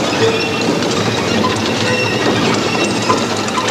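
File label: A#3 squeal; WAX SPROCKETS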